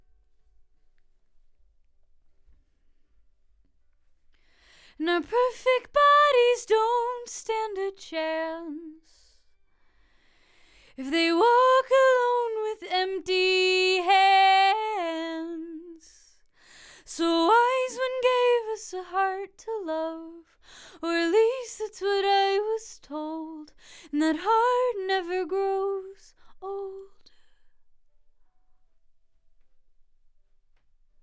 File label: Vox